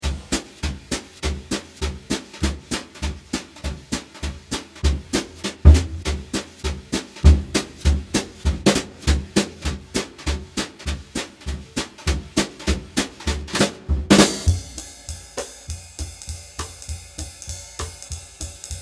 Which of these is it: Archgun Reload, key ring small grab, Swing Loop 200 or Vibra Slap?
Swing Loop 200